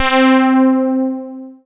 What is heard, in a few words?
SYN, analog